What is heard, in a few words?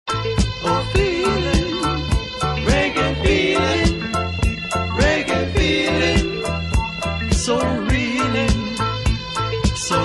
Reggae Groove